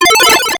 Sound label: Coin; SAR